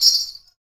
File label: METAL, Afro Shakers, Ankle Pods; Roland LCDP14 Africa VOL-2